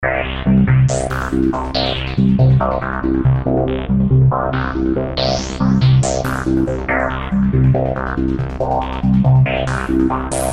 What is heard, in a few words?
Acid sms